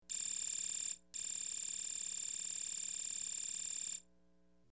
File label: Constant Beeping Vid, beeps & tones